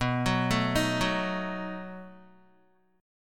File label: B 7th B down Guitar; arpeggiated; JGuitar